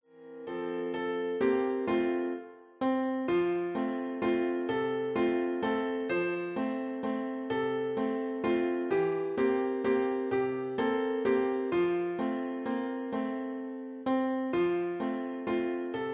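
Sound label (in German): Ich geh mit meiner Laterne, Klavier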